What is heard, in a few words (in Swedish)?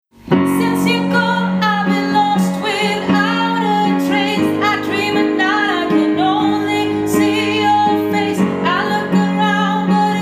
melodi